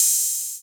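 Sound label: Hi-Hat open 808; Roland SPD-S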